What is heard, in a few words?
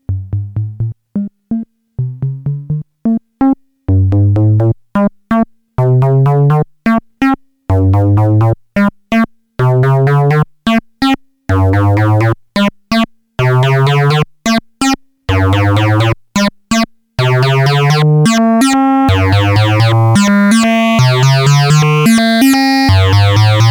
wavefolder